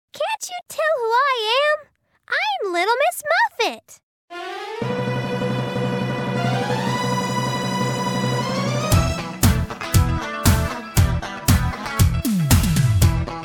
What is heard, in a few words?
Karaoke; Little Miss Muffet